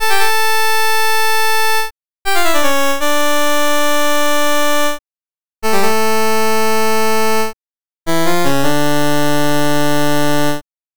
jingle classic bach tokka

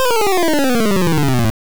jingle encount

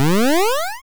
jump

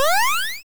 jump

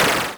hit

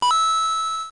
item get

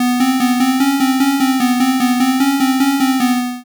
jingle curse

